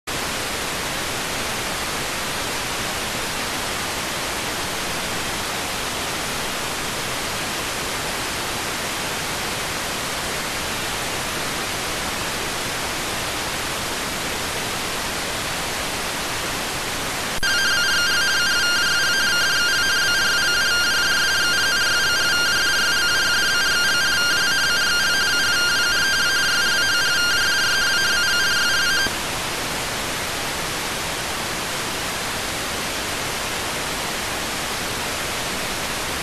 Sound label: rtty rx